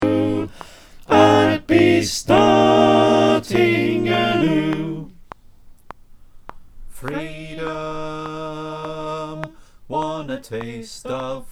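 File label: soprano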